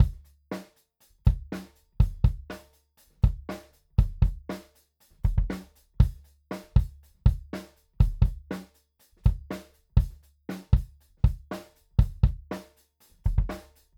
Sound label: kick i47 fet front, drums; crowley and tripp el diablo